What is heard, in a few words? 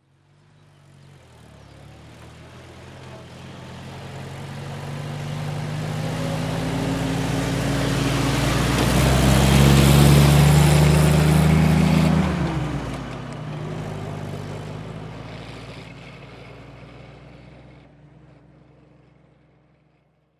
Army Jeep Slow By